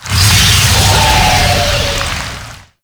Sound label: Infested Lich Larva Embed; Warframe; Abilities; Zeke